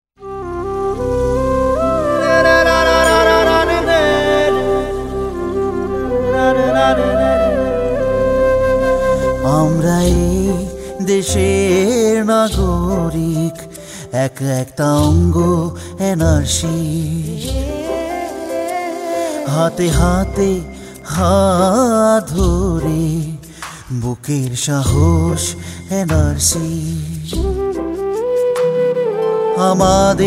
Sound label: jingle bengali